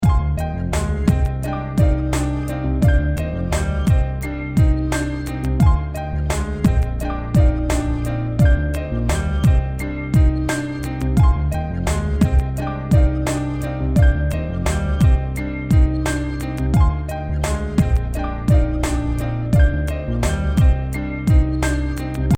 MPC 2000 XL; RYTM2

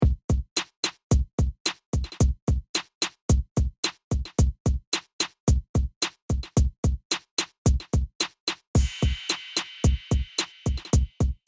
drummer

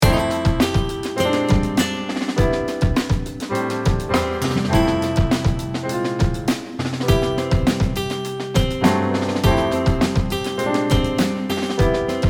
DRUM COMP DEEP

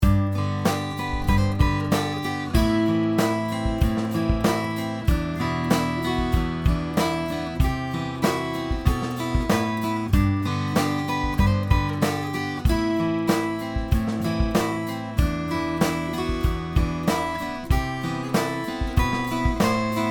NEUMANN M149

